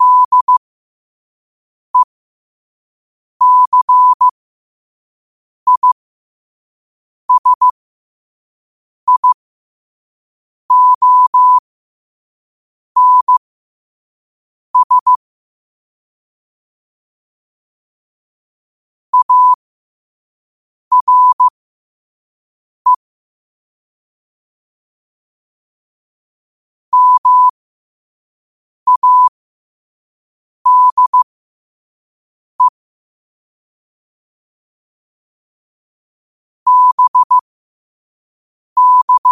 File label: 5 wpm, morse